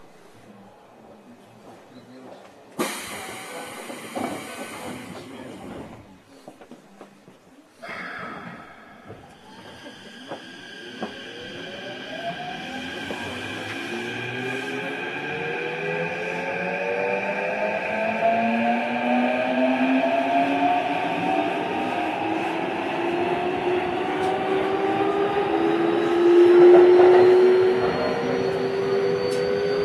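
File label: variable-voltage variable-frequency inverter, Mitsubishi; keikyu 1613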